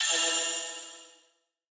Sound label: collect; upgrade